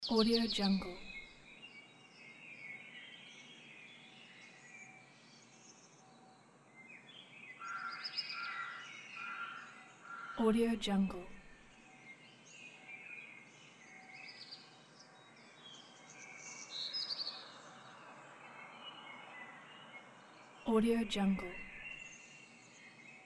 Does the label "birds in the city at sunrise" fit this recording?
Yes